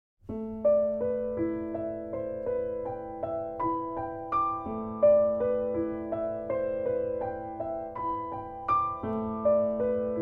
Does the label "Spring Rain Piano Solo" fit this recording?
Yes